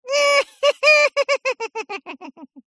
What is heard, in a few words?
cartoon witches cackle laugh